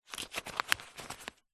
foley money note bill polymer handling short close up